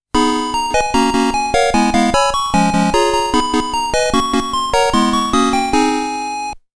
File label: Game Over; Monster World Complete Collection; Monster Land